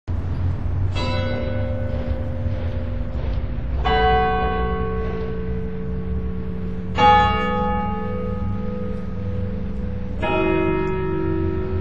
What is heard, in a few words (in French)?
glas; jumet